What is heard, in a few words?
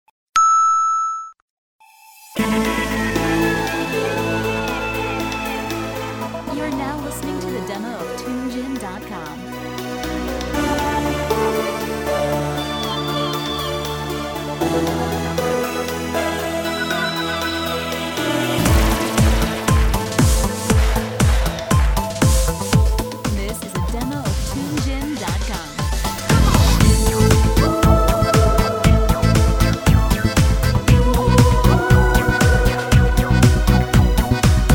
tango